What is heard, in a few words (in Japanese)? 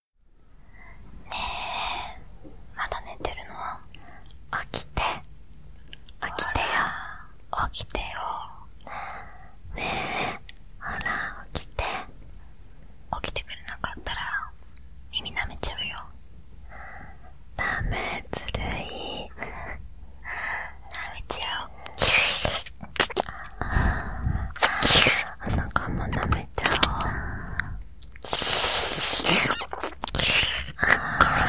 モーニング耳舐め; ASMR